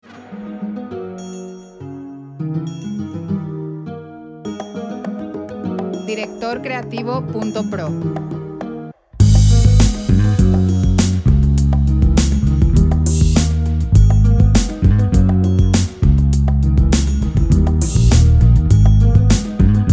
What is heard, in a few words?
125bpm 4/4 Abm